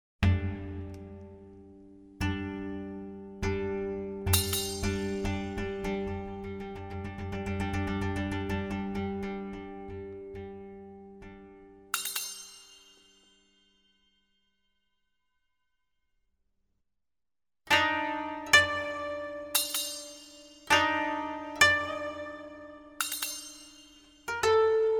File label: Interlude Koto